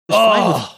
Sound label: aagh